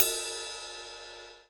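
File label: Ride Hardest, cymbal; hydrogen drum samples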